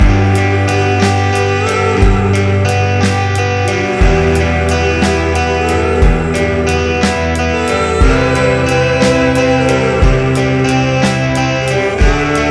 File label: 50s Or 60s Inspired Loop